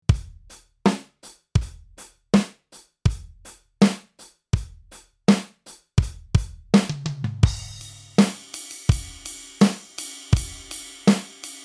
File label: Drum Kit